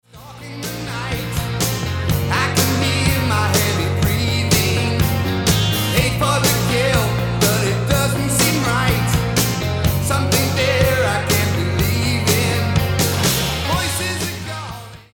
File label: Chords, rythm